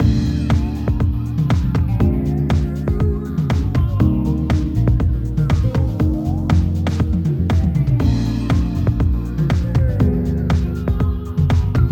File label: soul mix